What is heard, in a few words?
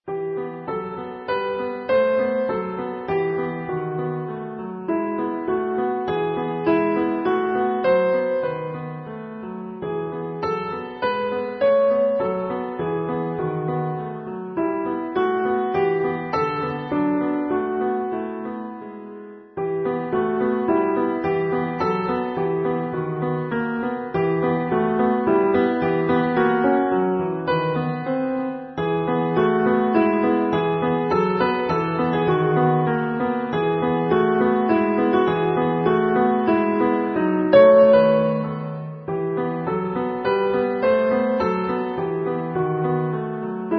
musicbox